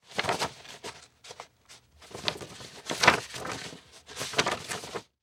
Photographs Shuffle, Household

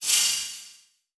Transition Metallic Hit

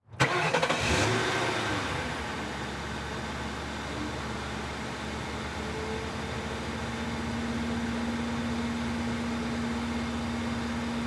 Police Car Start Idle Off; Auto; Transportation

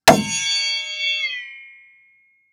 Impact Metal Ring Underwater; Impacts

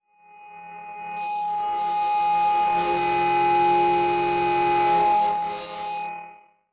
Transition Distortion Power Source; Adobe; Production Element

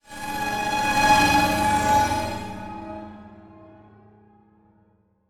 Transition Tremolo

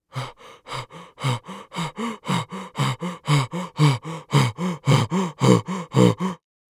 Human Male Panting

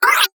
Multimedia Internet CD-Rom Flash Wipe Cartoon

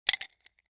Nunchaku Hitting Together, weapons; AdobeCC

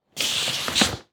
Foley Footstep Hard Sole Slide On Tile; Foley Footsteps

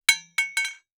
Impact Cement Hit By Copper Pipe

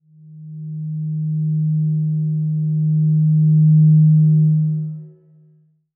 Title Transition Stab Dark Short; Production Element; AdobeCC

